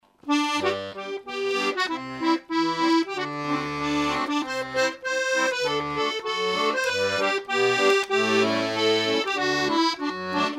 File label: polka; Svinedreng